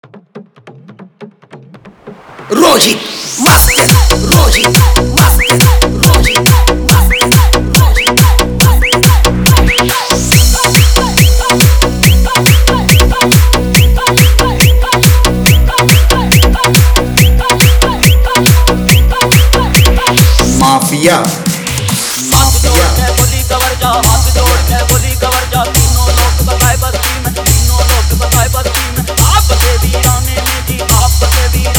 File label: Bol Bam Bhakti GMS Remix Song